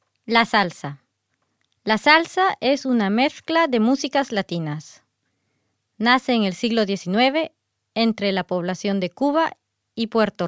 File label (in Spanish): La salsa